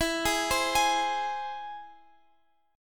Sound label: Ab Augmented E